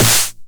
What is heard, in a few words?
909 SD Tape Sat